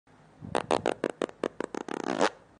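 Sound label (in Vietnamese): tiếng đánh rầm to kéo dài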